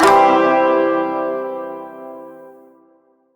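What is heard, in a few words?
Shawzin Pentatonic Major Chord F; Chords; Warframe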